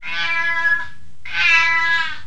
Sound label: CAT